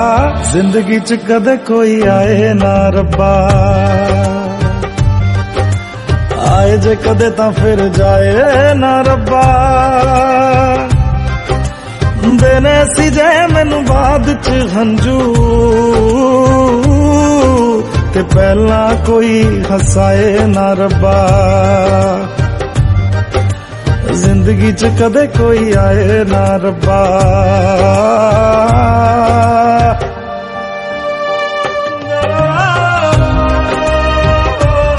lofi punjabi song

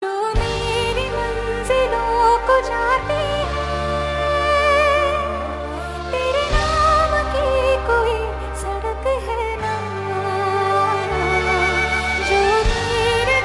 hindi

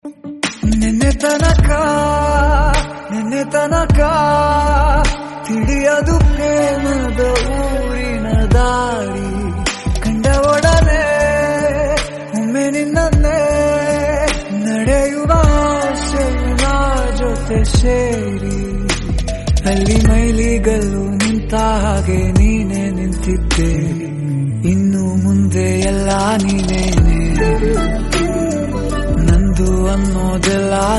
kannada song

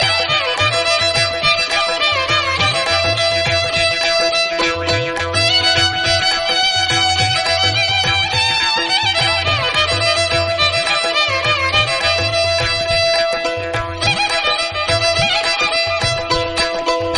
violin; ishqzaade

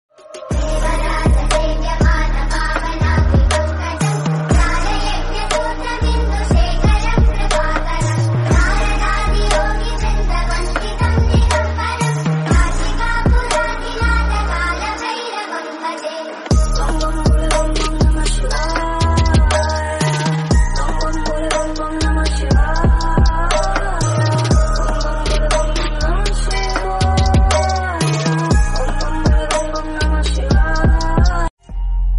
om namah shivay

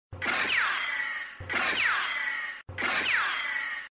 gun fire

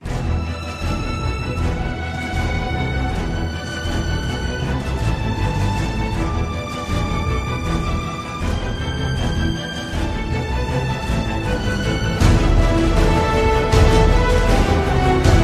theme; escanor

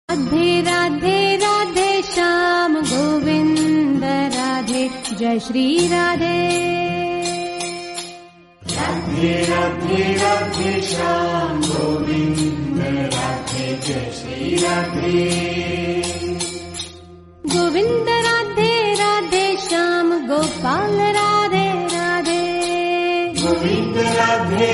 Hindi, devotional

